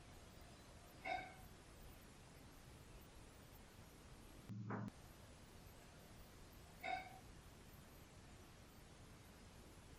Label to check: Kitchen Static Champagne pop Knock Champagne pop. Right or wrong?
Right